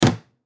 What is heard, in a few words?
Garbage Can Hit, Foley Garbage Can Hit Fist Plastic; Household; Blastwave